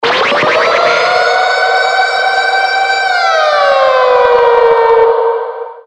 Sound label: alert sms